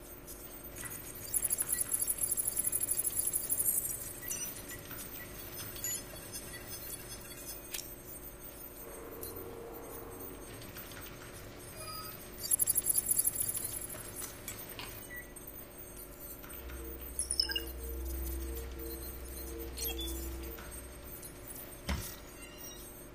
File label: command center, backgrounds; Secret Agent